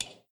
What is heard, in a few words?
metal ladder footstep